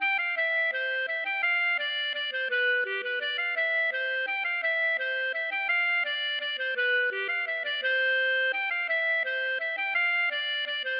mazurka

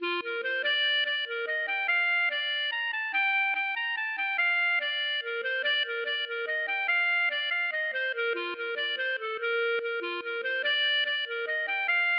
mazurka

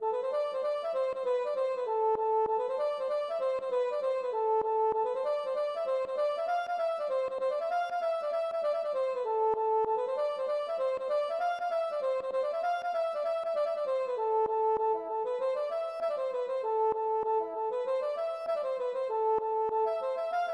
gavotte; Suite LAH

